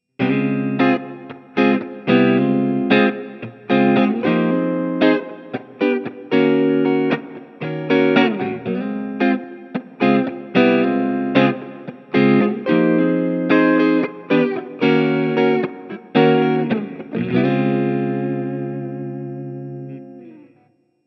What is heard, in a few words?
Small Session Board Basic Clean